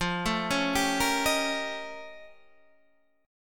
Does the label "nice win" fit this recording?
No